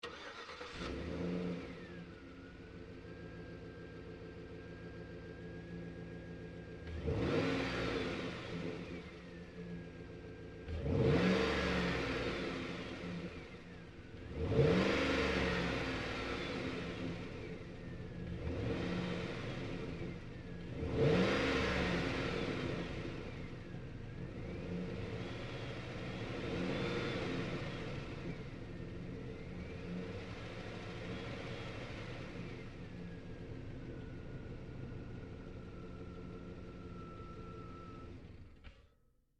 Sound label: Truck GMC Savana Onboard Start Revs Off Lite